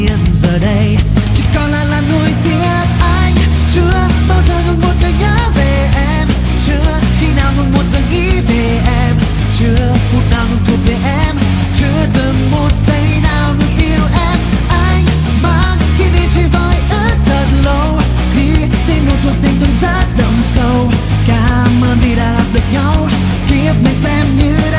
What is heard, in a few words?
rock metal; rock version metal